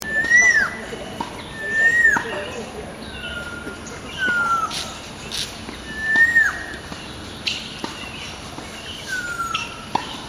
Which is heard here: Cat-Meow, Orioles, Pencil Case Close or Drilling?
Orioles